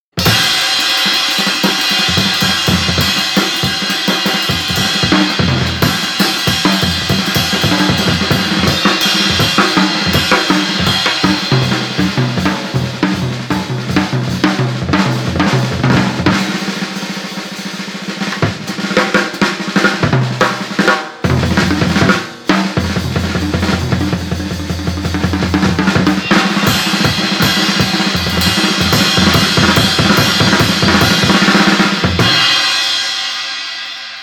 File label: Drum Solo; Kids on the Slope